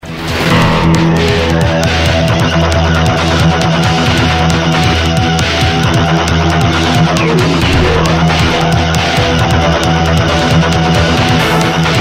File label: hard beat remix